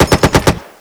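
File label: m3 grease gun short burst; WAR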